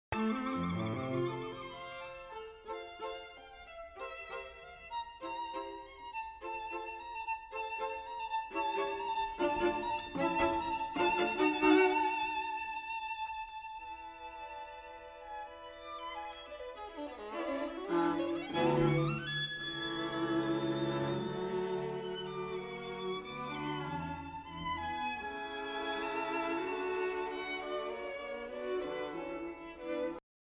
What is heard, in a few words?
String Quartet